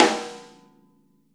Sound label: VINT KITS; Sonic Reality - Vintage Timetraveler